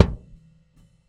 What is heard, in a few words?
Kick Ride Bed; Airwindows